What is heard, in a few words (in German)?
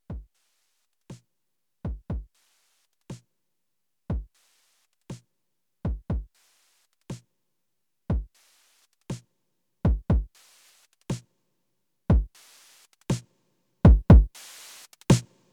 Bastl Bestie Drums Distortion u Songpart